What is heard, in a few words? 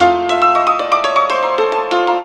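ZIMBAL MELODY; Best Service; World Colours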